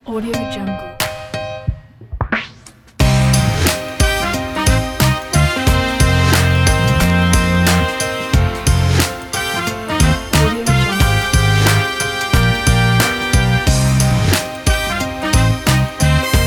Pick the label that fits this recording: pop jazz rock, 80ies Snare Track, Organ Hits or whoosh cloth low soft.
pop jazz rock